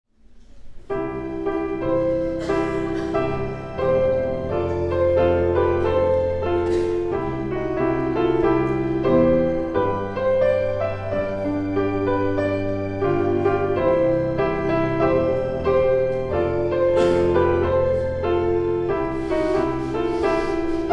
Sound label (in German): Vorspiel